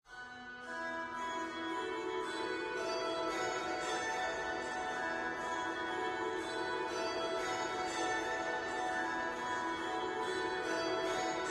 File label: Cliff jump ambience no wind music